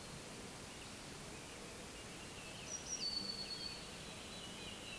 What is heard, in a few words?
Parus caeruleus